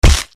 sword light flesh; conan

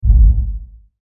lfe kit impact fast; imp audio

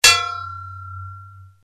sword light stone; conan

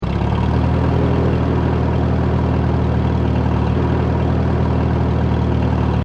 Tractor Engine Rampup

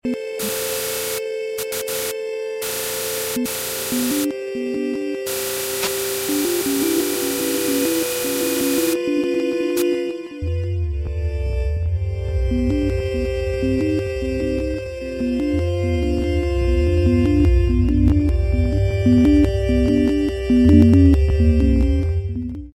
silent tuning

